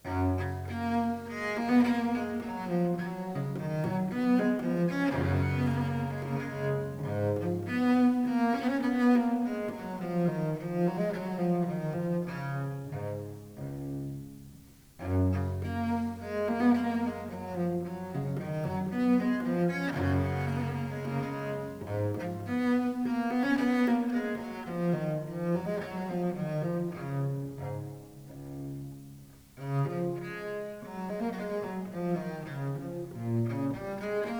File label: Minuet, Bach's Suites for Cello